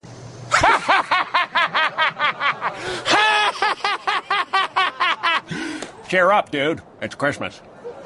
cheer

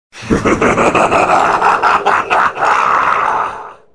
laught

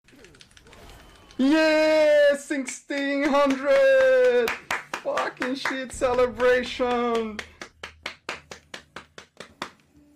celebration

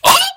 ohhh

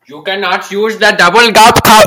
gulp cup

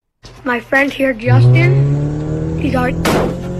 laughing; tiktoks to start 2021; try not to laugh

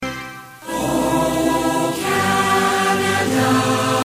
canadian national anthem english lyrics; oh canada

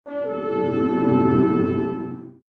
monk conversion warning sound; aoe2

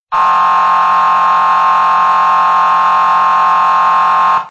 air horn

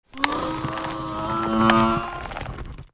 cry; klawf

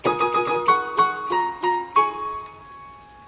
oriental riff

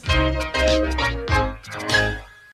happy jingle

